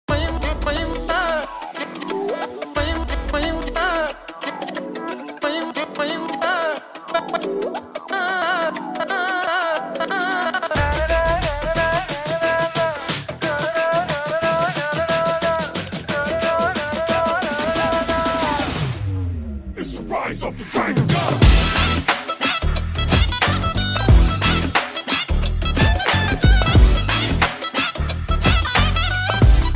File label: bgm